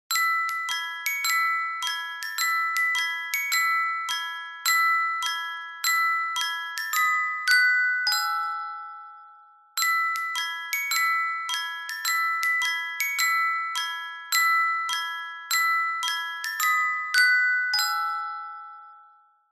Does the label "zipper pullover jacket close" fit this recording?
No